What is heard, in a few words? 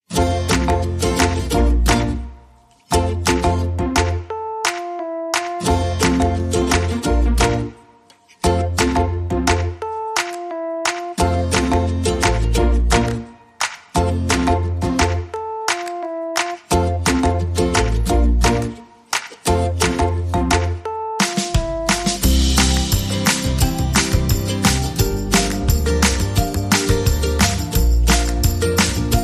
No Electric Guitar